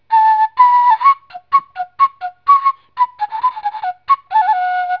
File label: flute